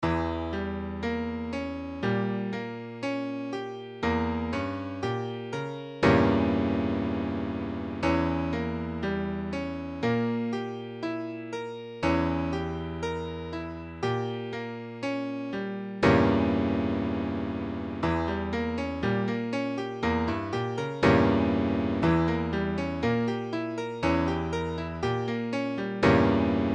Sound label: Edim7, piano